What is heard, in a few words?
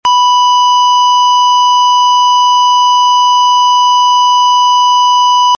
tone